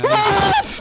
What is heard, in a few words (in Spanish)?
grito